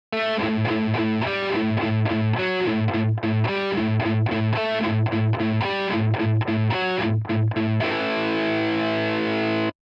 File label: riff lesson